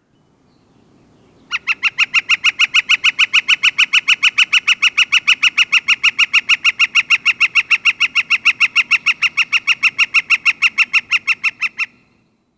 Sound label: Northern Flicker Kik-kik calls